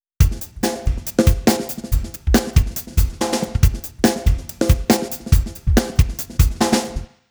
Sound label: drums; timestretch